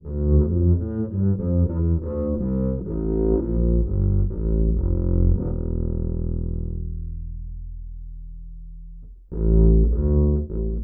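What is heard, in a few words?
acoustic bass Bowed direct input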